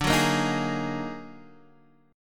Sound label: Eb Minor Major 11th